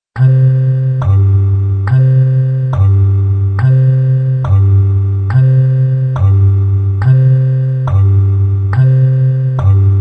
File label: Jingle Bass Walk Fast, piano